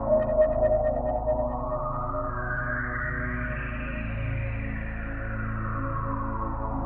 techno pad 140; Techno2; Keys

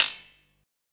SNARE, INDUSTRIAL

